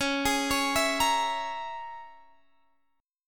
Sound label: C sharp Minor 6th C sharp, Guitar Standard; C#m6; arpeggiated; JGuitar